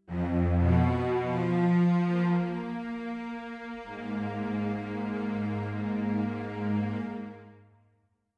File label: strings; Undead Overlord